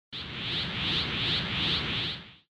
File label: power sfx